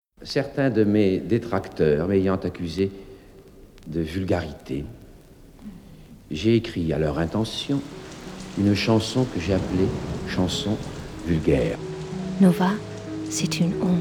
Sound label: Radio Nova Jingle; Nova 25 ans - 1993